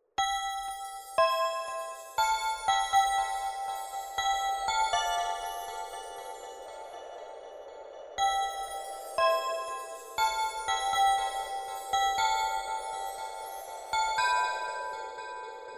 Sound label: orchestra